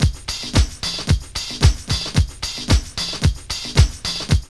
DISCO LOOP; Zero-G; Funky Elements

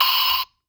Disco Drum Crash, drum machine; Funky Fever Disco Drum Sample Pack; 300 Drum Machines

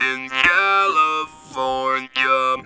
Talk Box; E-MU Producer Series Vol. 8 – Platinum Phatt

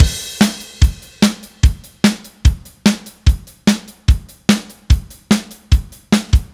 Silos Beat Crash, Indie Rock; Sonic Foundry (Sony Creative Software); Indie Rock Anthems; Session 11 - Silos

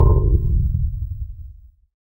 FX Dance; Sound & Vision - Gigapack II

